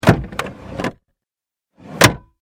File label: transportation car glove box open close; Car Glove Box Open Then Close; Cars; Vehicles; McKinney Sound